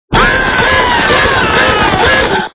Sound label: scream horn